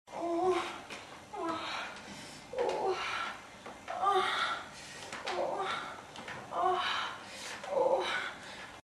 sex, moans; The Witcher Hunt

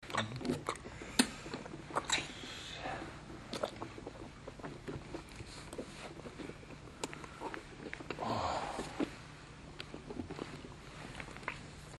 suck, moans; The Witcher Hunt

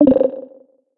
Button; Treasure of Nadia

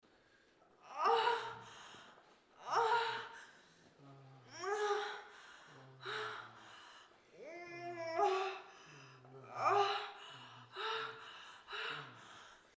sex, moans; The Witcher Hunt

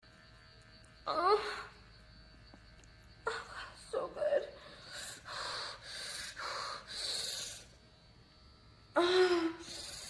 moan, moans; The Witcher Hunt

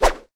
Swing; Treasure of Nadia

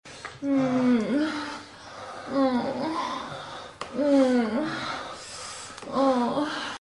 jerk, moans; The Witcher Hunt

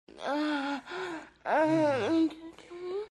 lick, moans; The Witcher Hunt

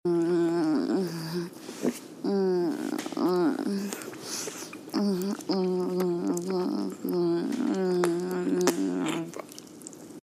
suck, moans; The Witcher Hunt

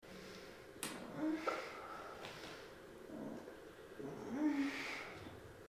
suck, moans; The Witcher Hunt

